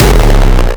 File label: kick oneshot monster E; GhostHack